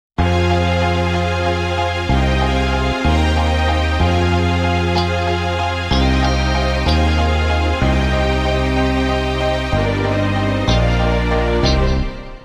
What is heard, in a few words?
brass minus